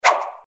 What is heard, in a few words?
Ice Ball Throwed; bob espo